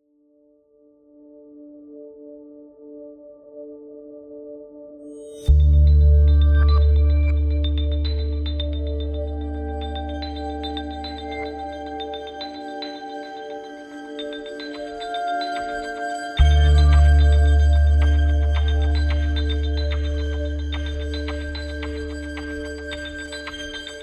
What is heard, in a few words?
Atmo Bed with Beat and Bass; Album: Tension Moods